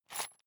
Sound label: sundries shake soft; berufe; handwerker; soundpool